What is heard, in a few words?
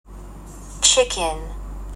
chicken